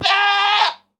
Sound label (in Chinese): 山羊尖叫受伤声